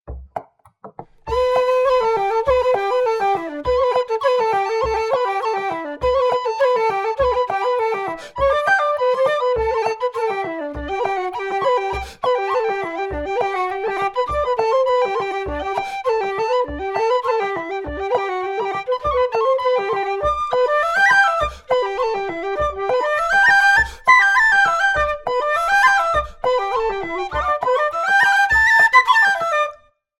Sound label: whistle flute perc fast; gregspipes